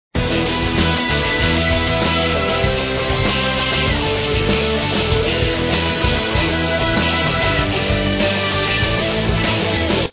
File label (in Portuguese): pierde; Ataque de Esporos